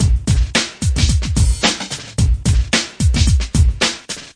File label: grooving rolls, 110 bpm, drum loop; 101 to 110 bpm